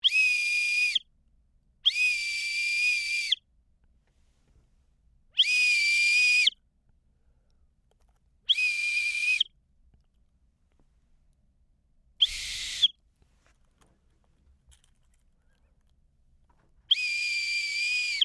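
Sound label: whistle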